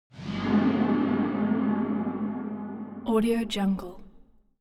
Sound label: cinematic tension tunnel horror